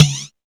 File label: MIX SD, DRUM RYTHM; AKAI S6000 CD-ROM - Volume 1